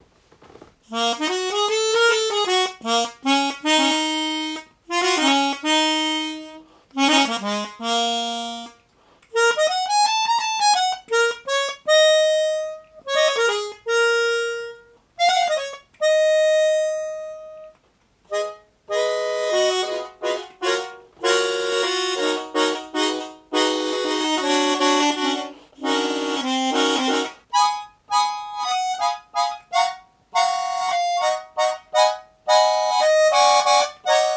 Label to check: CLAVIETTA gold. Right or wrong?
Right